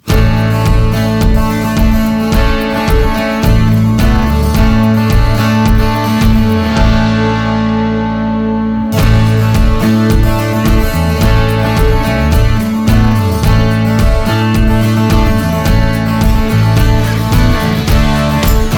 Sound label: full mix